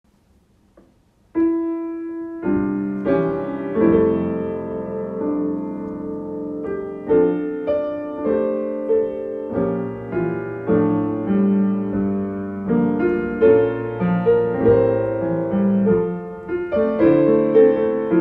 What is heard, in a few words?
Wedding Pianist Danny Boy